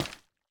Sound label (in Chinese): 树脂 踩踏声